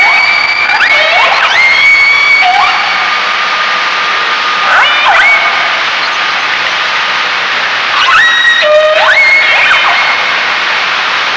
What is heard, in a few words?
Killer whale sounds